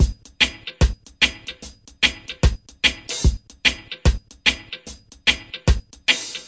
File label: 74 reggae kick hat snare guitar bubble; 000 to 080 bpm